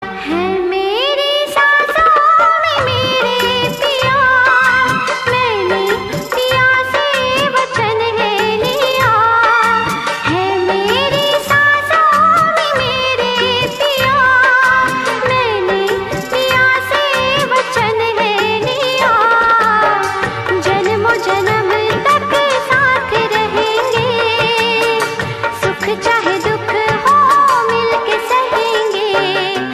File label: Ringtone